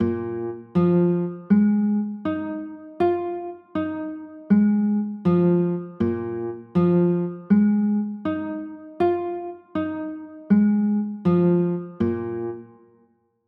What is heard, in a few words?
b flat 7sus4, chords